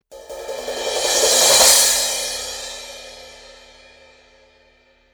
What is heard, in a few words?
sabian 16 aa thin crash